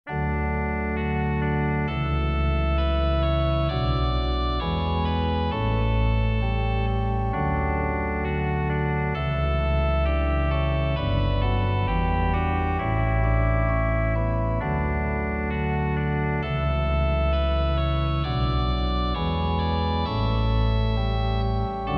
trumpet tune in D, organ; Short and easy Trumpet Tune for organ. Trompette d'orgue, piece courte et facile

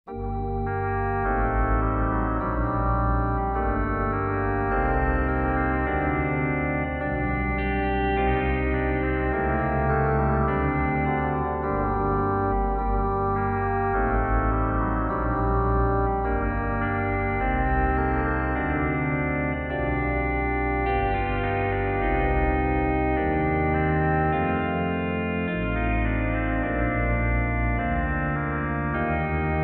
trumpet tune in G minor, organ; Short and easy Trumpet Tune for organ. Trompette d'orgue, piece courte et facile

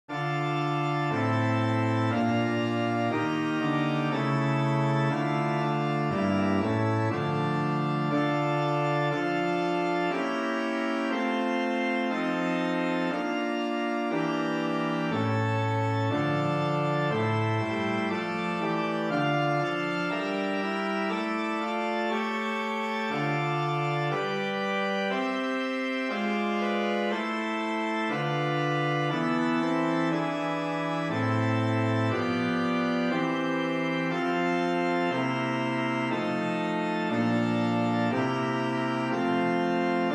koral transposed in D minor, organ; PiteaSoM

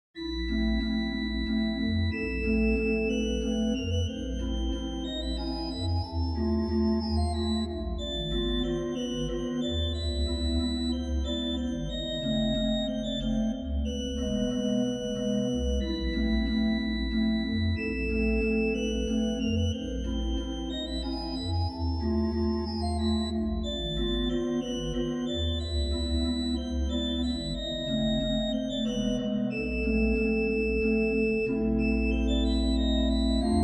arabesques wet, organ

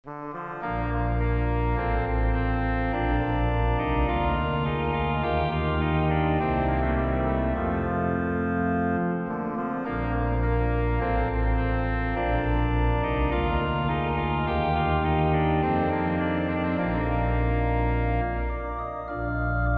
trumpet tune in Bb, organ; Short and easy Trumpet Tune for organ. Trompette d'orgue, piece courte et facile

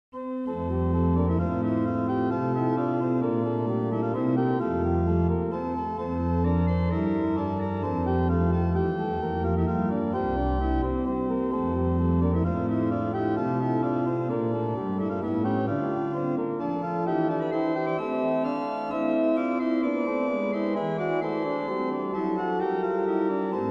det dufter a 3 voix reverb, organ

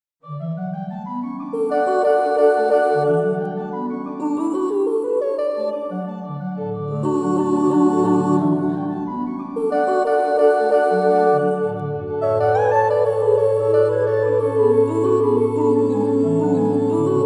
female, organ

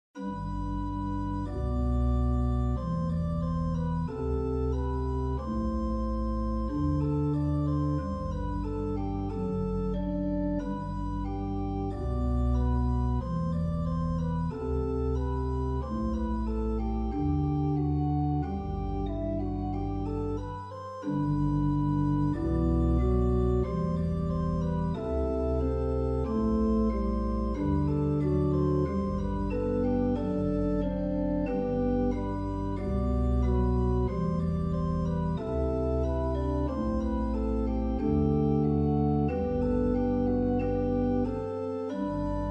Little Chaconne, organ

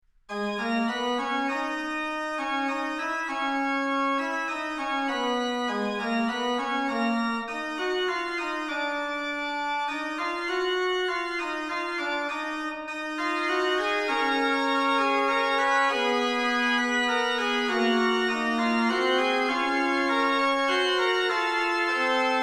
prelude G min, organ

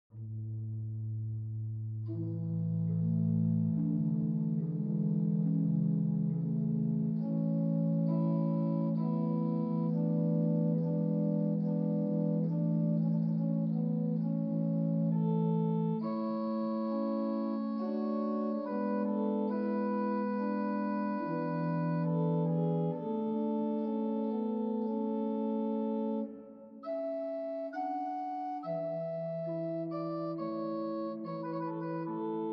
saint-pierre de sales marennes, organ

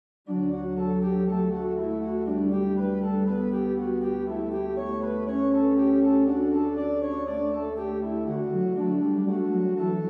little book C dur wet, organ; SAINTE-AURELE

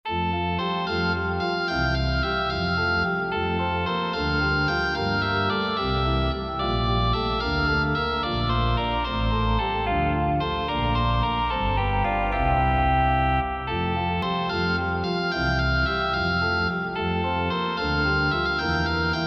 trumpet tune in F, organ

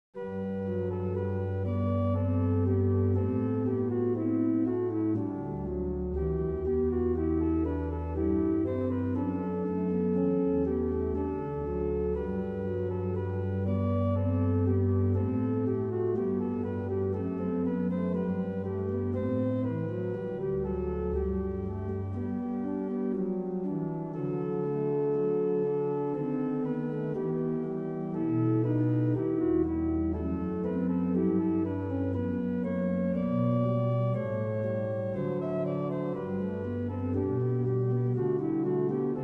softly variation reverb, organ

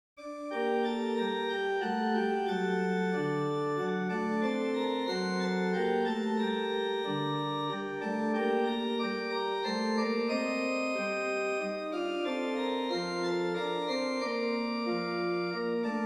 organ, air with trumpets, G minor; Mini Organ Book in G minor; Mostoles

